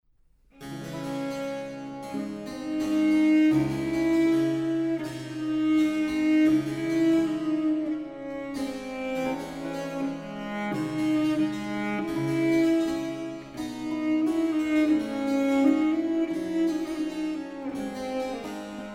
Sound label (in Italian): Sonata III a violoncello solo; Domenico Silvio Passionei Sonata III a violoncello solo e basso continuo en re menor: I. Adagio; arcantus Musikproduktion; El Galeón